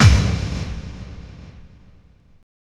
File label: combi drums